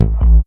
JNG BASS, Yamaha DTXpress

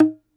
DOMRA, Segundo Domra, Plucked Strings

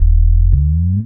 BASS, Drum & Bass, 170 BPM; Ueberschall; Drum & Bass Resonance

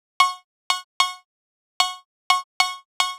150 Perc, Drum Loops; Zero-G; Soundclash Nu Dance Electro; Acidized Wavs; 150bpm; Cabin Fever

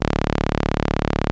ARP 2600 D2, synthesizer; Hollywood Edge - Giorgio Moroder Rare Synthesizer Collection

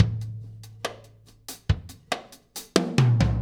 Earth Loop, Drum Tools, Laid Back

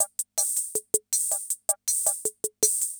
CR78; Best Service; XX Large Most Wanted

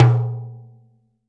L-TIMBA, TIMBALES

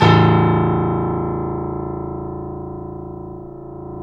KEY, Steinway ff

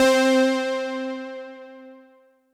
Synth C, Synth Prophet One Shots; Electronisounds

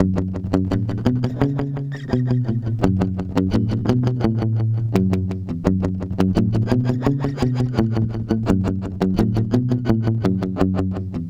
Guitar, Trip-Hop Loops

